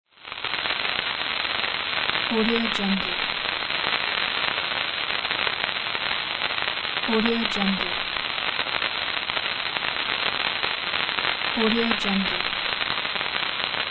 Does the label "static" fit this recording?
Yes